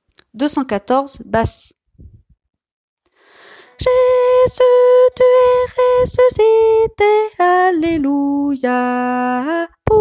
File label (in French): basse